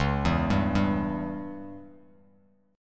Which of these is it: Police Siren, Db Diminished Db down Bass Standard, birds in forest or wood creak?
Db Diminished Db down Bass Standard